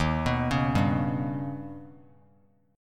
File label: D sharp Augmented 7th D sharp, 3C,3F,3A,3D; arpeggiated; 15,18,16,17; JGuitar